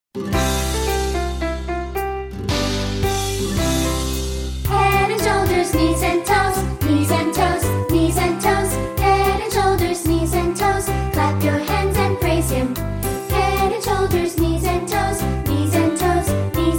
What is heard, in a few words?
Childrens Choir